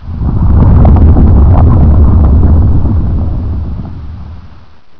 rock moving; cstrike; sopka

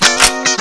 clip in; cstrike

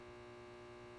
light buzz; cstrike

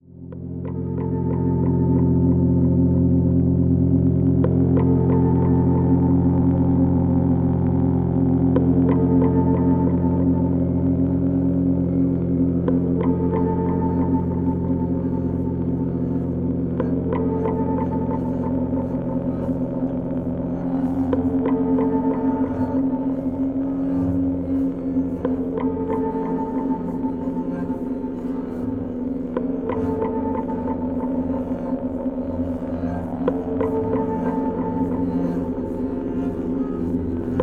ambed

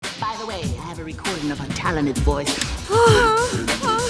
ruby sing; cstrike

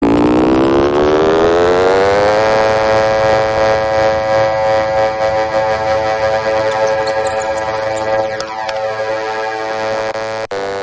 musical chairs; cstrike; jb rush

